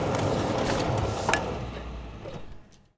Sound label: PHOTOCOPY, OFFICE; AKAI; 1000 Sound Effects